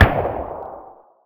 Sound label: shotgun barrel distant; hl1 weapon